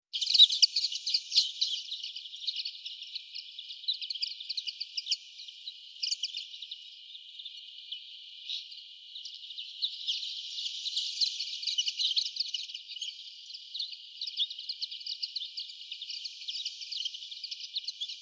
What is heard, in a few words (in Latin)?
Chloris chloris; SETE